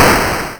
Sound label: explode; asdlteroids